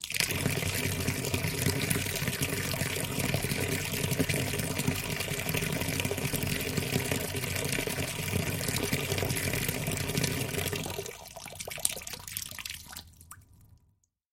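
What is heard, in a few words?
sounds of barrel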